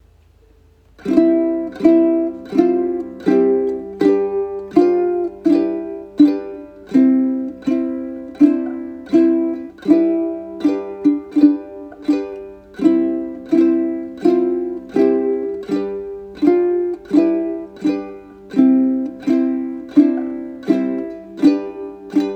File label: Uke Ode to joy; Uke book